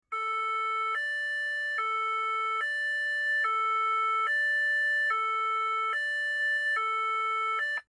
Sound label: Hi Low; 911 Signal